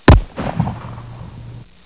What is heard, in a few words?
GUN